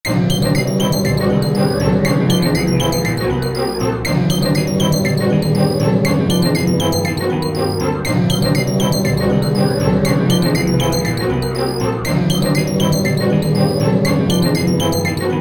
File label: Dark Cave